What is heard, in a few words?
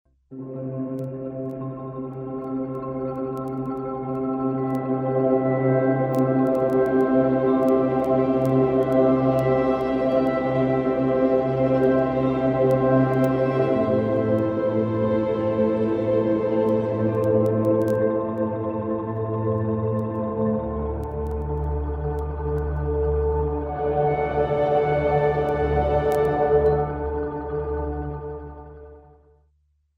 pads caribbean winds; NI arkhis